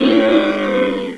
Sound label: voltigore pain